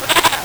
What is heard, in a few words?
LSDj Animals, Nintendo Gameboy; 300 Drum Machines